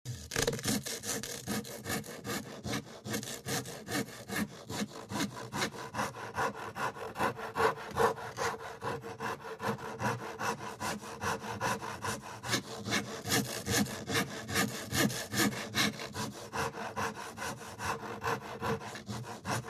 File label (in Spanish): sierra cortando